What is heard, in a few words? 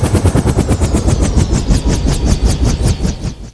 comanche drop; nst monster